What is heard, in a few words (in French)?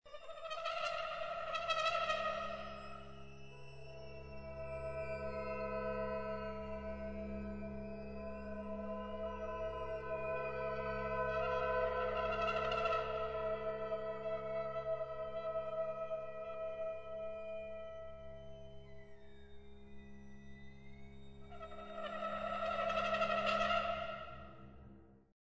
cuivre